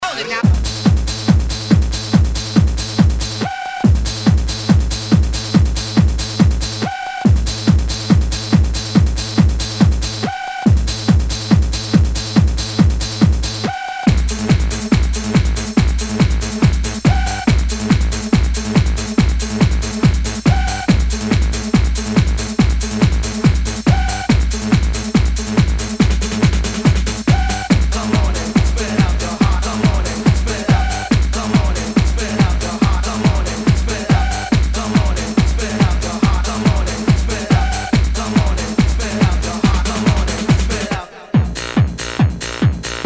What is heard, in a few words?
tribal techno